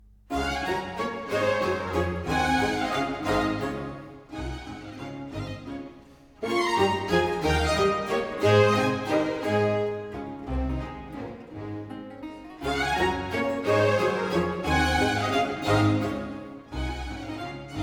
Sinfonia in D Major for strings, 2 oboes, bassoon and basso continuo, Allegro; Concerto, Venice The Golden Age